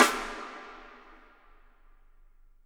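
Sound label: snare drum rim, left; Miroslav Vitous - Percussion & Harp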